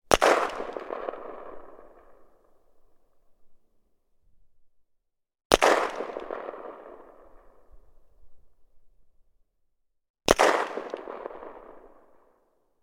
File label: weapon gun sks; still north media